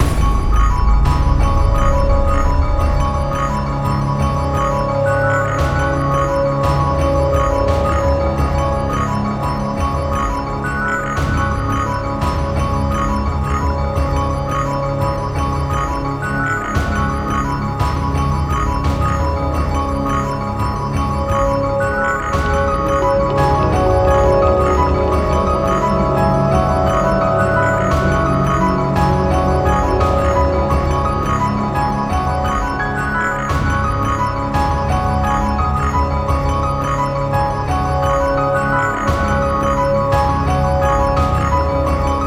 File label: Scary loop, BPM86